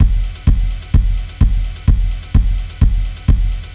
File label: Dusty 128, drums loops; RoBKTA Sample Pack Supreme